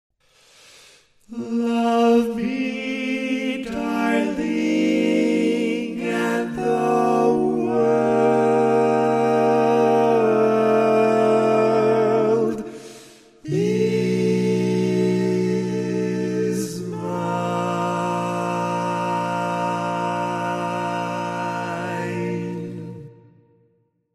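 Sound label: Baritone, barbershop tags; Old standard version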